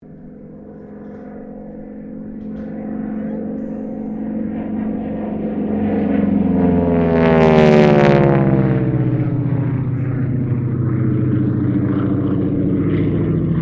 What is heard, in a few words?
AIRCRAFT AT-6D HARVARD MK IIB LOOP, Harvard Training aircraft; Airplanes; Vehicles; 1942